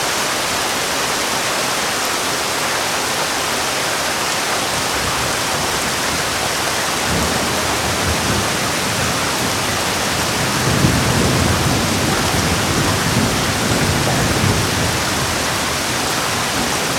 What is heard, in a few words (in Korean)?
헤비레인